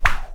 scatter appearance; Wild Guns

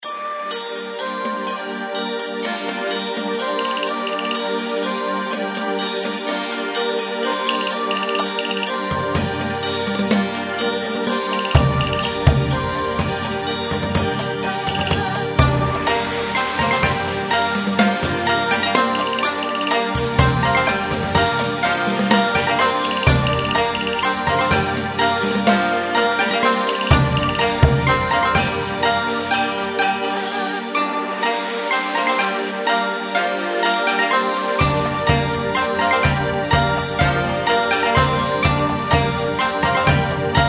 outro bgm; Imperial Girls

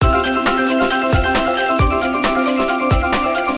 count up, slot; slot game; Hot 40

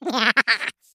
character penguin laughing; Fishing For Gold

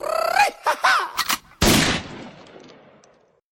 gambler symbol pear; Fruit Fiesta; wazdan

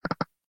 REELSET STOP; games; Bank Raid

